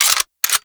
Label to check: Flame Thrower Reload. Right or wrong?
Right